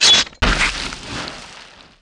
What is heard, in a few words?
Undiging Vehicle Loop; 1944 Battle Of The Bulge